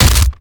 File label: Collisions Melee Impact; Lurker; Evolve; Gorgon